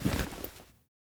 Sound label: bolt rifle deploy; Rust